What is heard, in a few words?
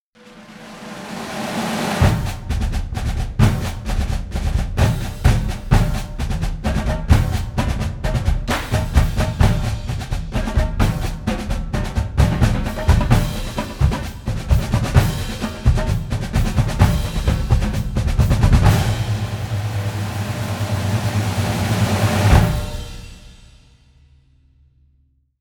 Drumline